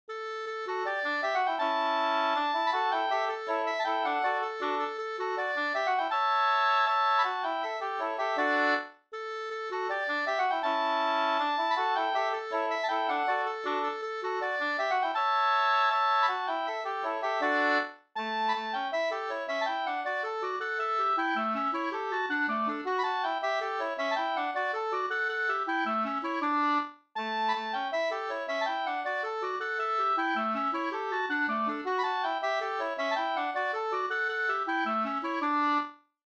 vals; J Enninger; Hoor

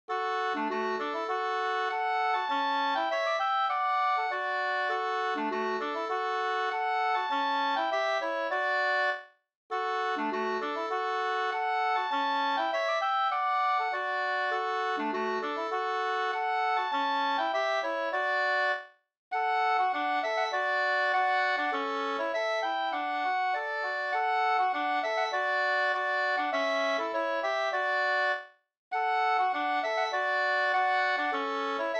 ganglat; Hemmarsch; Glaboln Bjorkvik

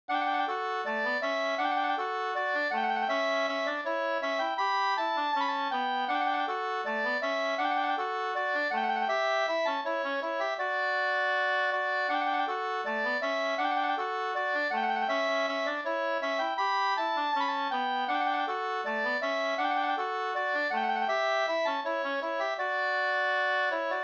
skanklat

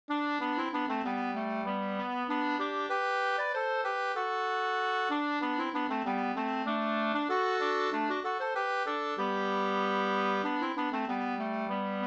ganglat; Soderbarke-marschen

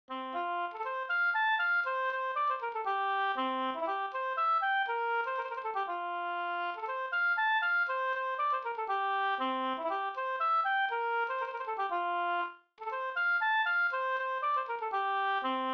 polska Bingsjopolska; e Blinda Palm e Pekkos Helmer

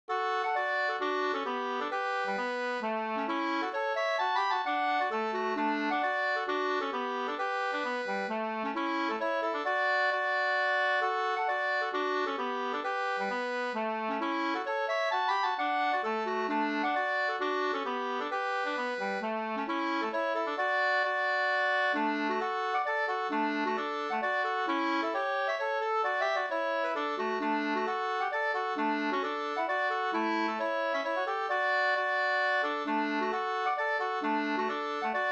hambopolska, 2st

